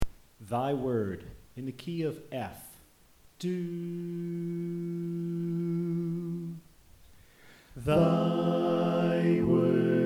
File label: Soprano Left